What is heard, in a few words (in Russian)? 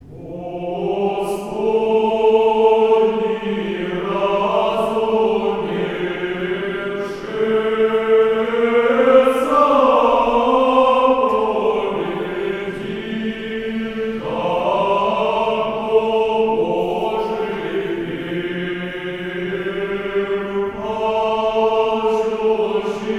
Хор Казанско Вятской епархии Господни разумевше заповеди.